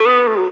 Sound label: vox (oh); AngelicVibes; Waves